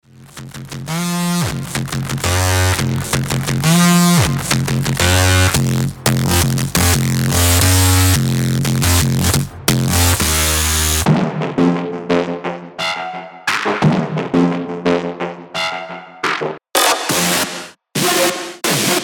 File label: synths